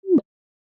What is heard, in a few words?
clear, audio cues; gitlab-vscode